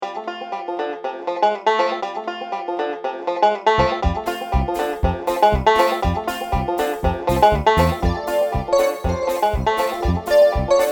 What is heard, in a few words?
bluegrass